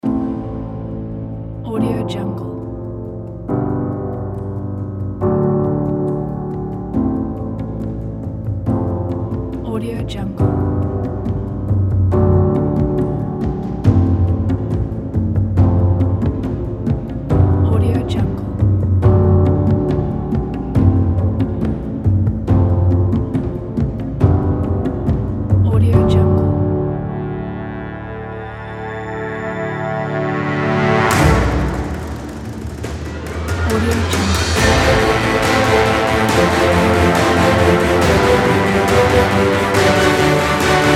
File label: victorious trailer